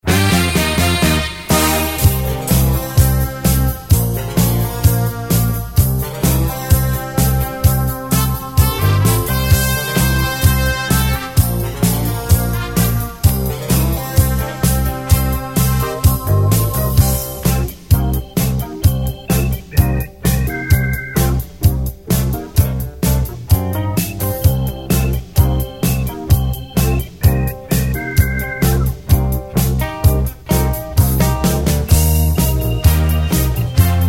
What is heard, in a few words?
music